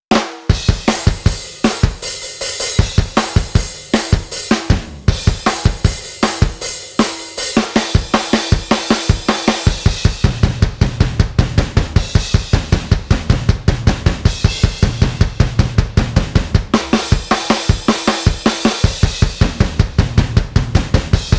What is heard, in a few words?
sonor of metal, studio batterie midi